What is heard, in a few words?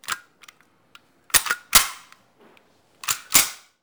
Gun Handling Weapon, Weapons Foley; SFX Kit